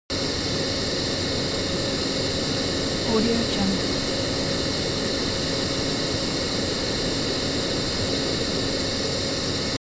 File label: transportation jet plane honda private small turbine idle